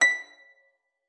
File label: HARP FORTE A#6; Xsample VOL-8